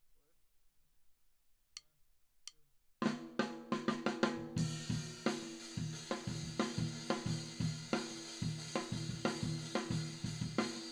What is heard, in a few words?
Tom